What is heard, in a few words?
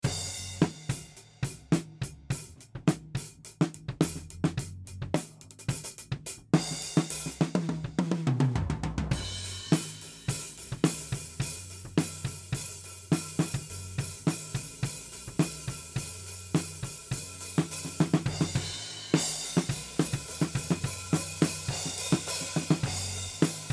Dual Array Facets Drums Overheads AB